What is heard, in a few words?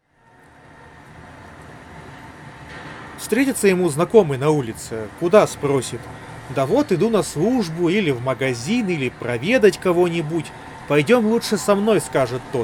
cable recorder noise; qcy h4